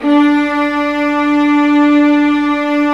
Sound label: Violas Bright, String Sections; Roland LCDP13